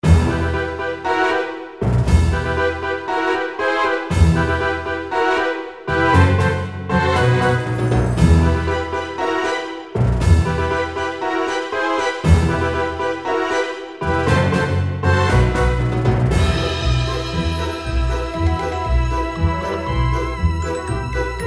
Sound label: Platformer Horror Game Theme